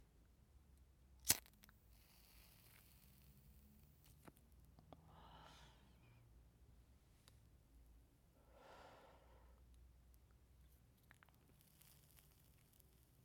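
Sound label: Lighting A Cigarette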